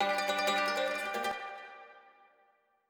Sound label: ethno, 160, C